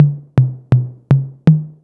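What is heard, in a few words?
TR-808 mid tom; TR-808 Internet 808-mt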